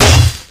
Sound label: hit world; 3rd degree; tf